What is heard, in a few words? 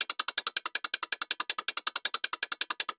Sphere Advance Switch Lp; moul